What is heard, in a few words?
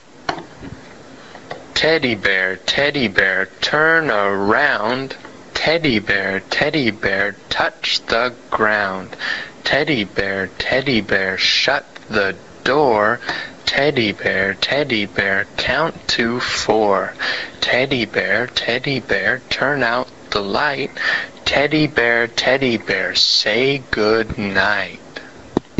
teddy bear chant; english beginner songs